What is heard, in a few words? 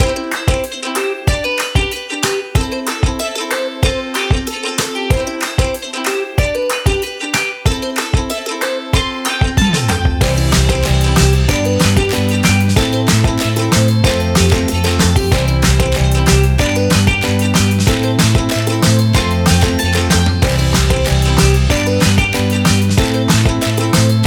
Joyful Dance Upbeat Joyful